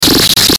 Stutter Distortion Glitch, Stutter Electrical Buzz Beep